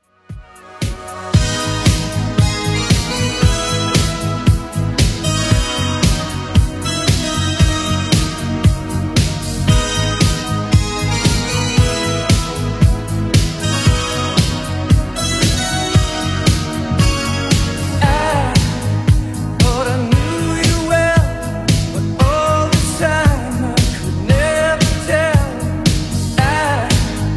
80s Redrum 115bpm

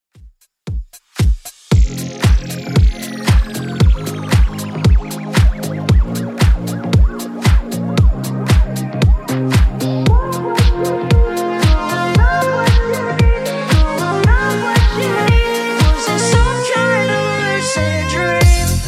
Top40 Redrum 115bpm

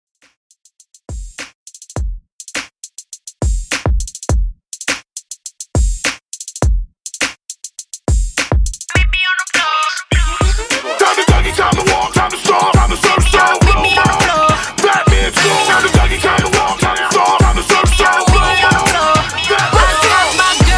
Hip Hop, 103 Bpm; ReDrum

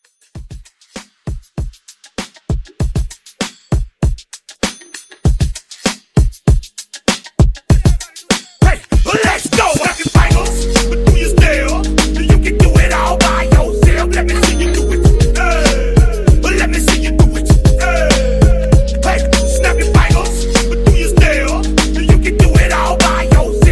Intro 98

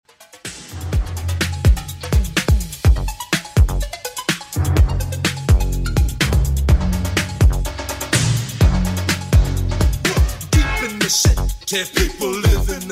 80s Redrum 125bpm

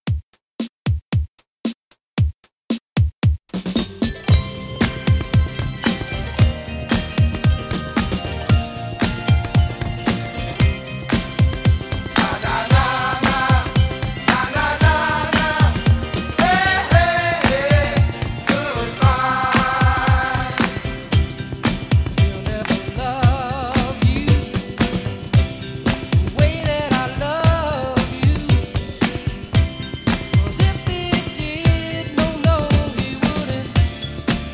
60s Rock Quick Re Drum 114

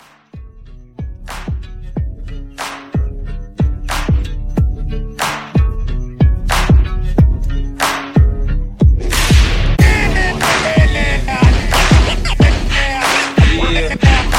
Scratch, 90

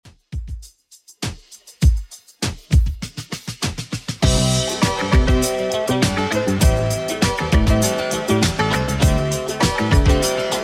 70s, 100 bpm; Redrum

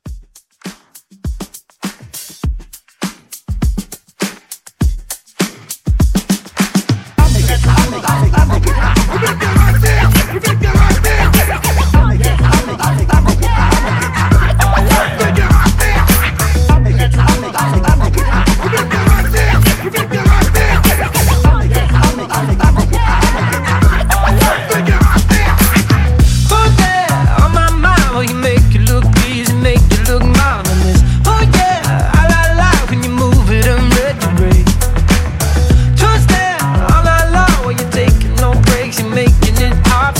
Club Hype; Club WWo Hype Edit

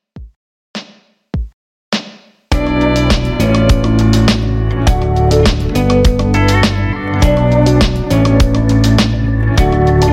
Country Redrum, 102 bpm

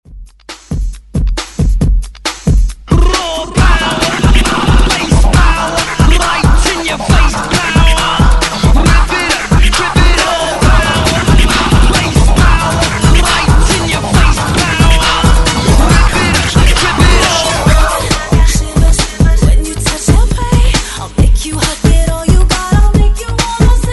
Hype Re Drum 126 Bpm

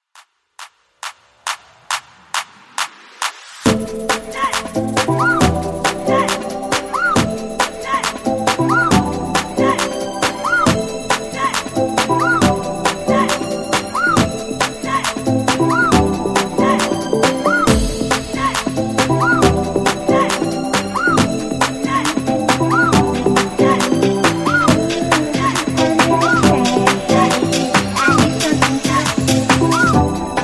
top40 hype 137 bpm

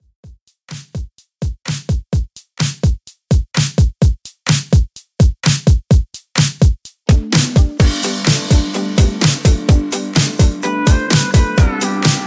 Country Redrum, 127 bpm

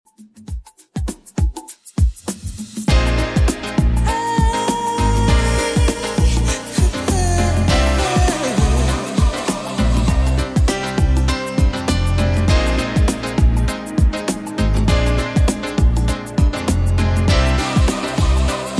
80s Redrum 100 bpm

